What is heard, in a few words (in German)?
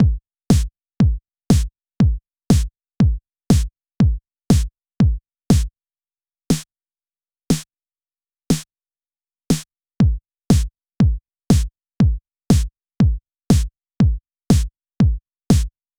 Snare plus Kickweglasser